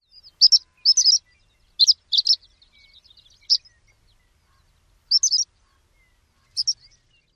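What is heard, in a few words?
Horned Lark call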